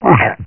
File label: die; svencoop; uboa; ichyhakase